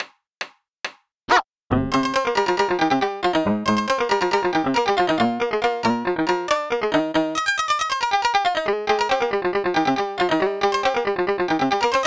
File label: Jongara Aircheck Hi-Fi